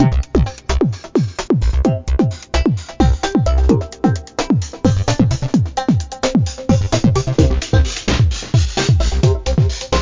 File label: Dance; Talking Pato; Android iOS